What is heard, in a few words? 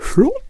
Bard Attack Vocals